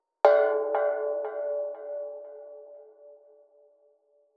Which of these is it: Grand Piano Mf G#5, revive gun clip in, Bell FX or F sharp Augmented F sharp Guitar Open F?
Bell FX